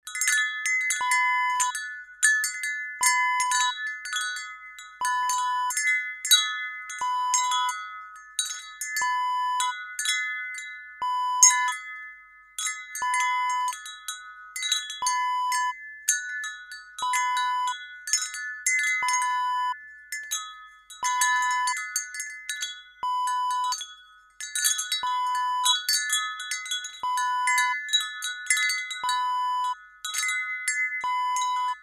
wind chime

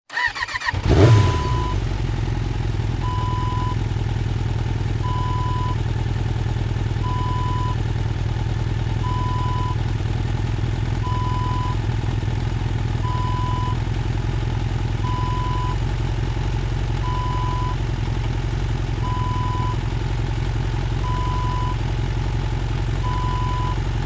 motorcycle start idle

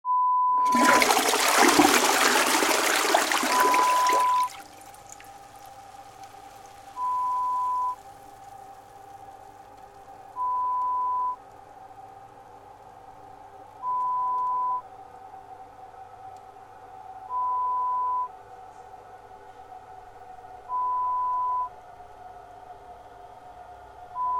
Flush sound

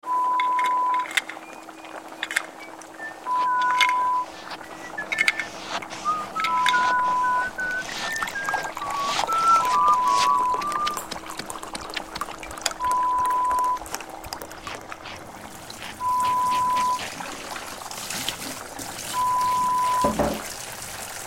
Mad Scientists Lab, Horror Sound Effect

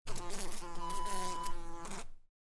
fly

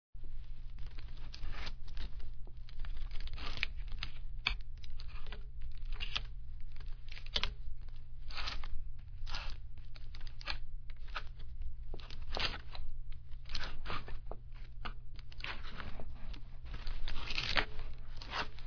clothesrack long

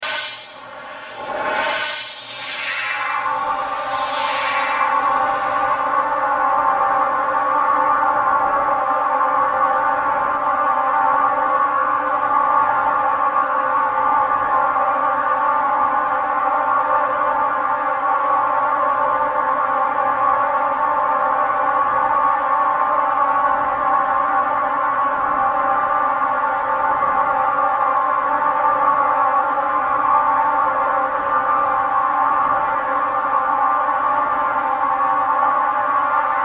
Sci-Fi SFX

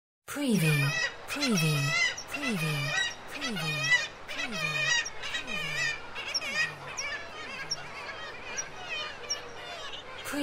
BIRD KITTIWAKE NESTING

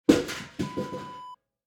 metal bucket down

